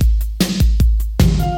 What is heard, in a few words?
151 bpm drum loop sample g sharp key